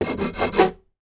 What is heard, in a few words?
industrial tools file wood; sound effects one